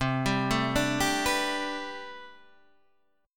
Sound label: A Minor 11th C, Chord